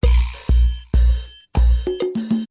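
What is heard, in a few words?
intro, drum loop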